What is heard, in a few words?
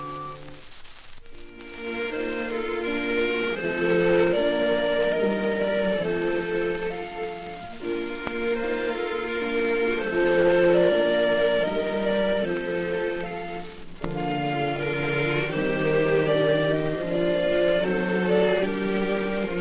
music